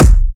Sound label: shiny trap bass drum single hit d key; kick